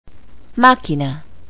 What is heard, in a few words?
machine; office